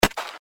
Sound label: D note